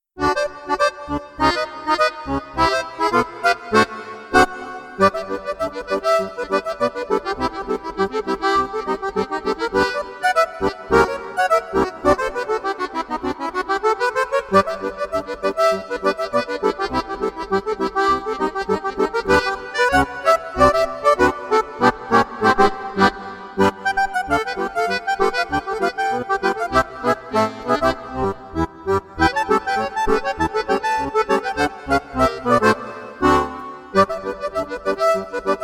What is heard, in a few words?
Jumping Rag Duet